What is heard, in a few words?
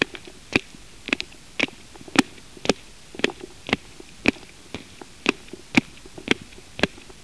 footstep